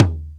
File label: Oil Filled Tom Tom, drums